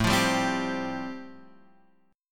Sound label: C Suspended 4th, A, guitar chord; Csus4/A Chord {X 0 3 0 1 3} strummed